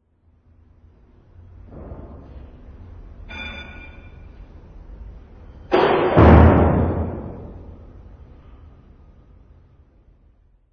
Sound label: arena door slam